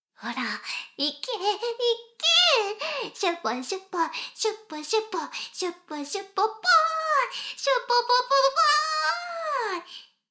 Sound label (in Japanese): しこしこ音声; 牧場戦腺; 少佐殿